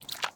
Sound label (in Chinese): 熊猫进食声